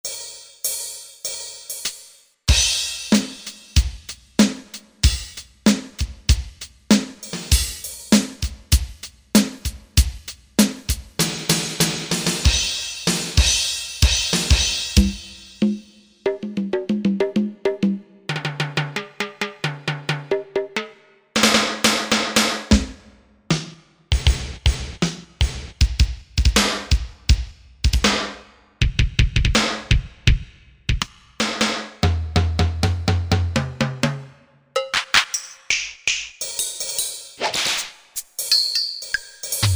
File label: DRUM KIT; Korg MSC-1S Variety